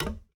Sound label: steel step; Golden Crayon Sounds